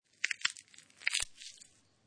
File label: Peanuts Crunch Nuts; Crunch Sound Effects